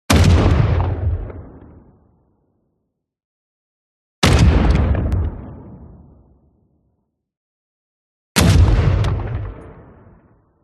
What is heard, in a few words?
Weapons Artillery Light Close